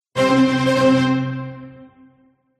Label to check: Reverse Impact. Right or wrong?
Wrong